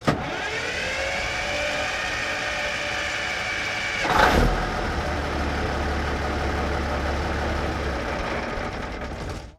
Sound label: 1954 Big Rig Ext Long Start Off; Period Vehicles